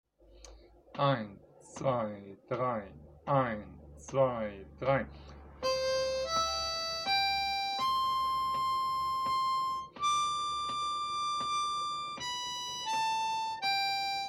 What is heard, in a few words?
bunessan harp; Morning Has Broken (Bunessan) - harmonica